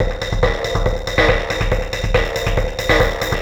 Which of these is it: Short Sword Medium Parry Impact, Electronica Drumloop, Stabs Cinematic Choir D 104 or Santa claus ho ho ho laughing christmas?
Electronica Drumloop